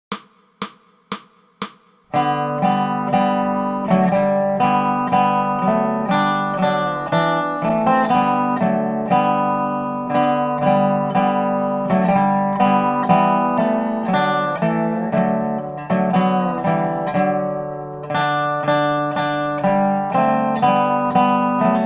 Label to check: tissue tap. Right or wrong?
Wrong